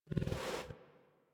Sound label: Tame Interact Stand Scratch Sniff; Enemies; Warframe